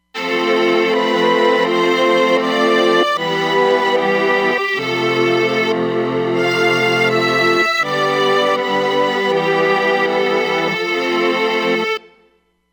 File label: Tronic Str, Roland Fantom XR